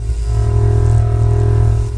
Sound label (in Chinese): 就绪后的轰鸣; 红色警戒2; 超级武器音效; 铁幕装置